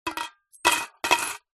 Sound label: foley coins down in metal container